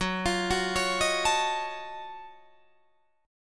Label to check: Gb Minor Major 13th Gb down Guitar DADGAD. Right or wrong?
Right